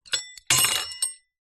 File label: bell small reception desk bell drop on wooden desk